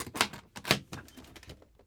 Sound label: SUITCASE, Tools; AKAI S6000 CD-ROM - Volume 6